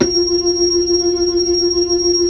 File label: B-3, Hollow Ch